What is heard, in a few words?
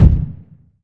fire, combine soldier, far; hl2